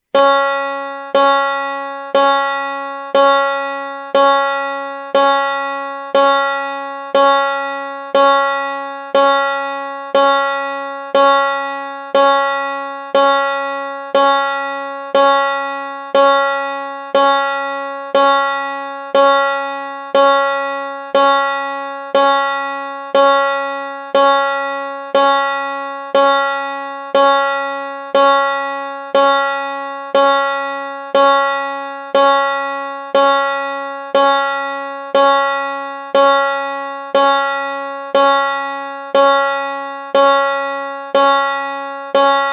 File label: Cis4 Banjo